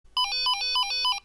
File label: Ring